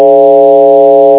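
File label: chirp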